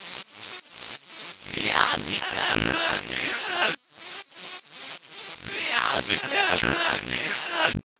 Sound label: Vox, Circuitbend; 99Sounds; Mangling Audio